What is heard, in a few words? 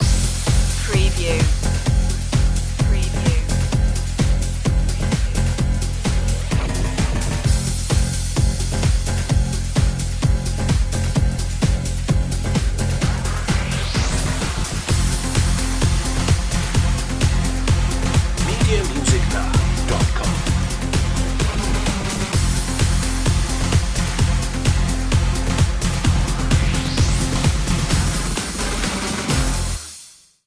body storm, dance trance techno